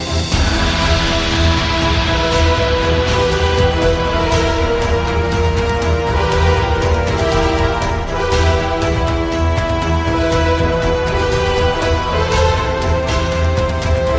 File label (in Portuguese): IMPACTO; pack de tilhas e efeitos 2.0